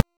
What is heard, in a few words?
Cloak Cast; Warframe; Frumentarius